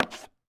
plywood step; Golden Crayon Sounds

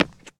concrete step; Golden Crayon Sounds

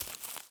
weed step; Golden Crayon Sounds

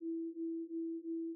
Liveness Scan; iOS 27

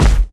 concrete land; Golden Crayon Sounds

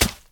mud step; Golden Crayon Sounds

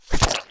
impact; Original TK Sounds

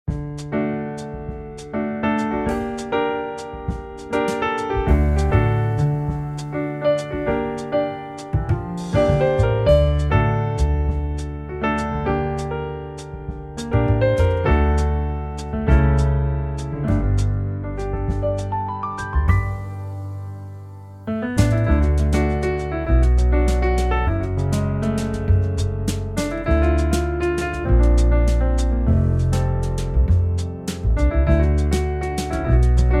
background; RS Games Sounds; Scrabble

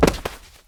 marble fall; Golden Crayon Sounds

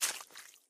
mud step; Golden Crayon Sounds

